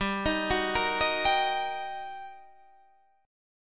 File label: G Minor Major 7th Sharp 5th, G, down, Guitar, Standard; GmM7#5 arpeggiated; capo=2